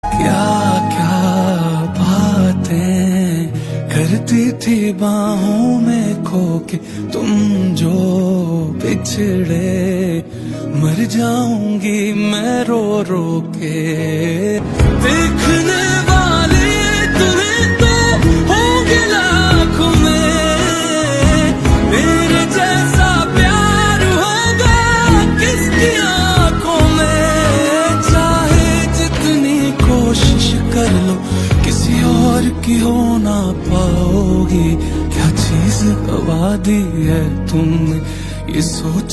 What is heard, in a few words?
Romantic Hindi Ringtone